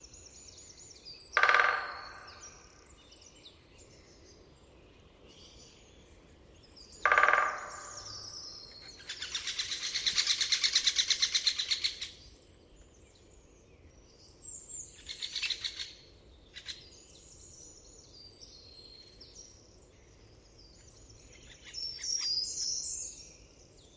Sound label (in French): pic epeiche; chant